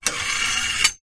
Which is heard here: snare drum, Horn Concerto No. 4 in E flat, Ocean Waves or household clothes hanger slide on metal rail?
household clothes hanger slide on metal rail